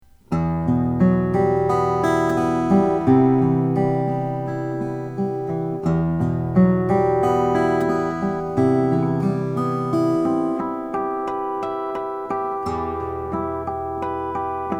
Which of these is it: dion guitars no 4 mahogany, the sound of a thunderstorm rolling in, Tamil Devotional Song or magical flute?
dion guitars no 4 mahogany